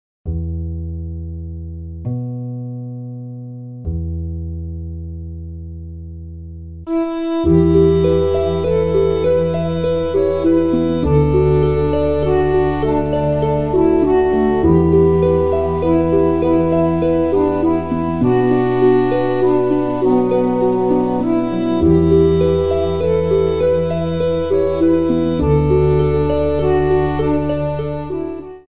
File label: backing; what child is this